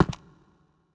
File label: step; GoldSrc